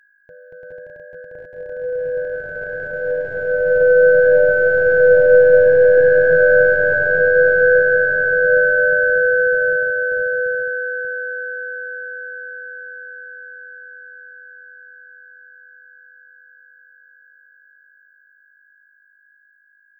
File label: collide 10000 electrons; sound of two electrons clapping